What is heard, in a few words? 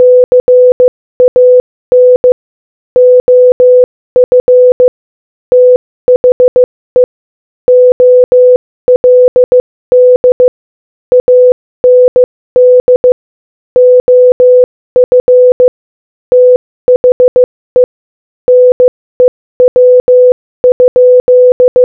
Morse Code Audio Speed 15 15